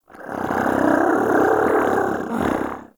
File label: Combat Vocal Idle; Warframe; Catbrow